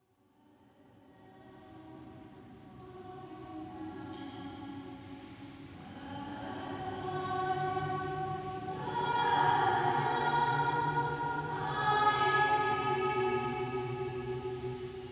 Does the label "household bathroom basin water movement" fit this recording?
No